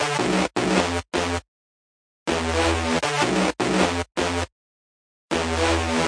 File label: ripping spy bass